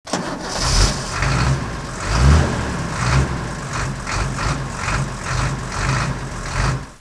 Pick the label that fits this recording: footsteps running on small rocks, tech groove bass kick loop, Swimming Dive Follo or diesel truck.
diesel truck